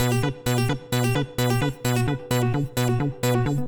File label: synth, edm samples